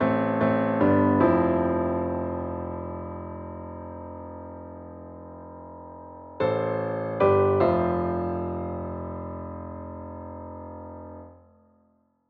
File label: Piano Bridge